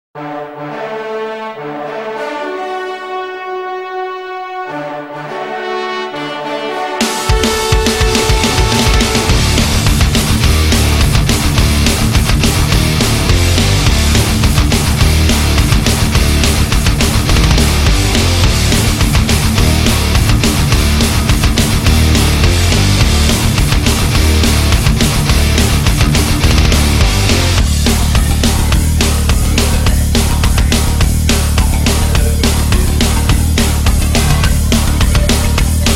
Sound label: instrumental